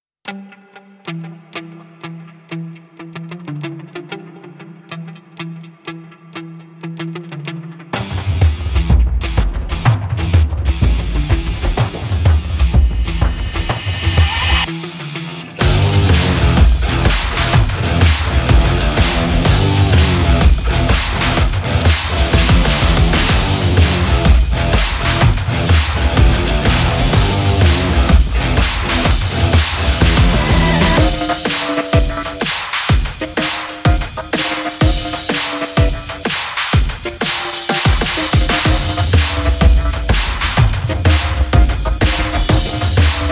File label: Rage Riff; Showtime